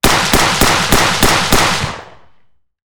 Heckler & Koch G36C 5.56mm 1m left off axis clean Six shots; MKH416